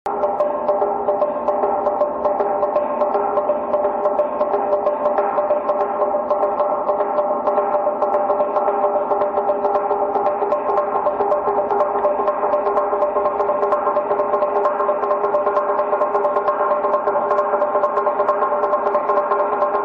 Semantron

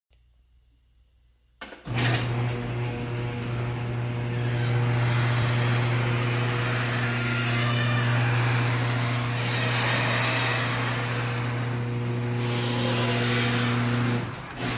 Parking door, باز شدن در پارکینگ

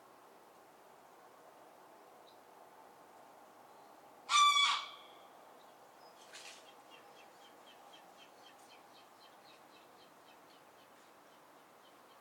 Anser anser; غاز وحشی خاکستری

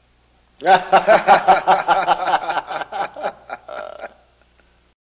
Laughing Man

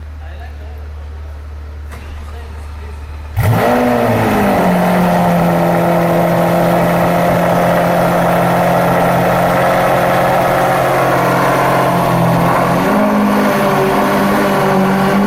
Lamborghini Aventador

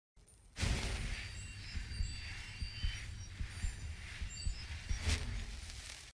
healing in game

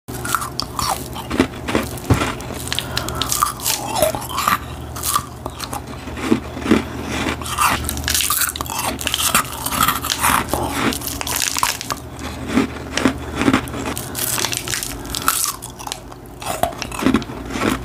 Eating Freezie; چالش خوردن یخمک

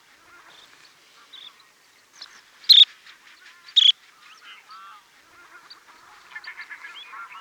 Curlew sandpiper; پرنده تلیله بلوطی

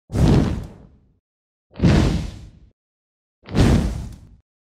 fire torch

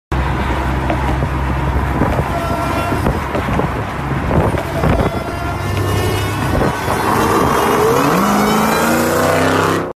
Dodge Challenger

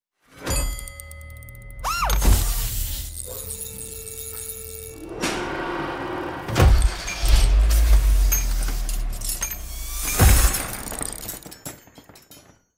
production Tool

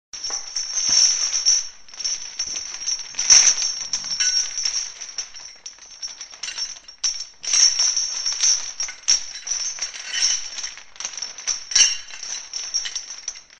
Walking with chain

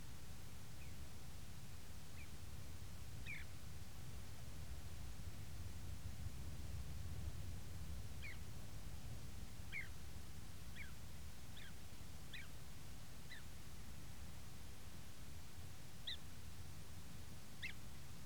Rufous chested dotterel

